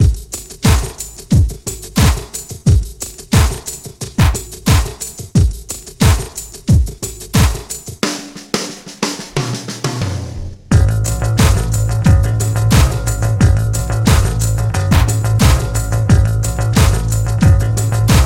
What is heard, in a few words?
Flash Rhythms Track